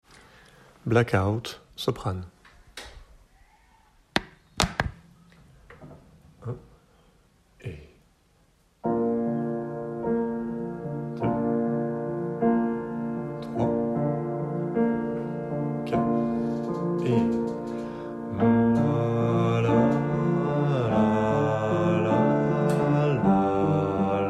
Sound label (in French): Blackout Sopranes